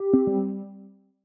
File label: Low Battery Low; Lenovo; ZUI 13